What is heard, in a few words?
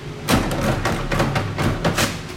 paper towel